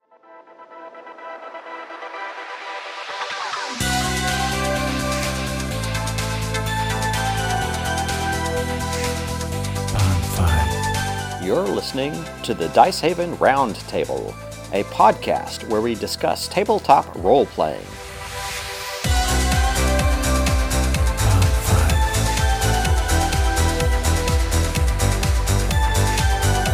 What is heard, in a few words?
intro space; Dicehaven